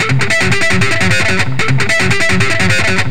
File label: Riff, Industrial; Nasty